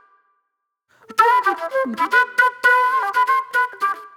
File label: Flute, Disco; Sonic Foundry (Sony Creative Software); Manhattan Flutes; Session 04 - Cruisin' Disco